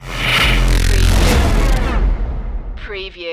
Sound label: hybrid orchestra stinger